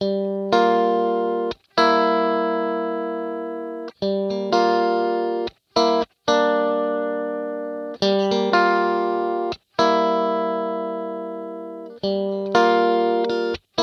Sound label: Electric Guitar Dry